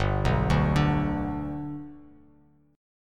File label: G Major G down Bass Standard; G arpeggiated; JGuitar